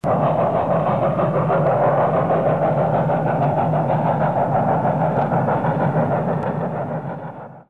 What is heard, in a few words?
Night Train